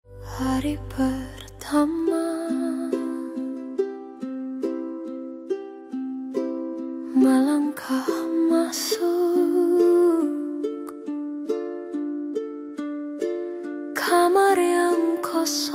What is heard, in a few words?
Retro Soul Ballad Nusantara Folk Fusion